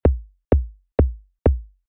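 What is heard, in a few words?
kick saturation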